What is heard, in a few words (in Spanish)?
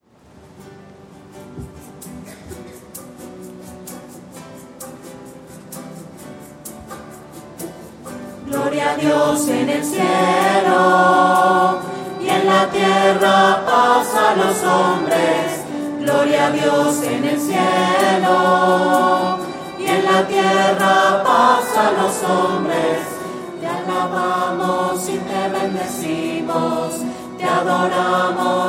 gloria voces; misas 2012